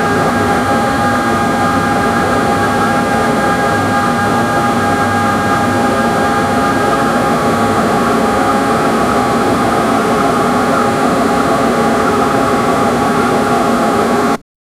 steam engine whistles

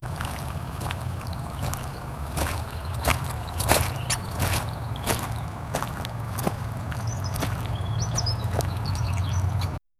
gentle footsteps on soft

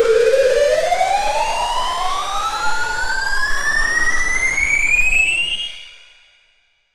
lifting sound, gear

